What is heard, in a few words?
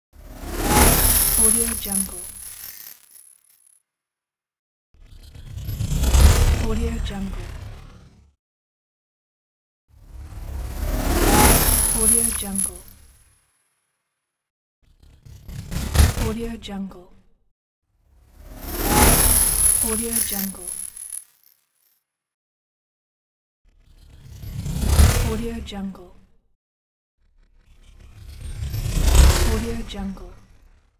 high tech movement